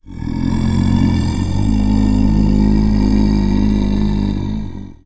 single chant; hl2 npc; ep2

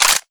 bipod down, weapons; arccw

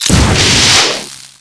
vort attack shoot; hl2 npc; ep2

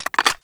p90 clip in; arccw go

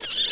rat die; hl1 npc

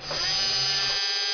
motor loop, turret; hl1 npc

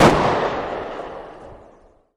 cz75a distant; arccw go

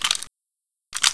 glock reload; hl1 weapon

